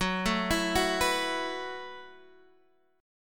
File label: F sharp Minor 11th, Guitar, Drop D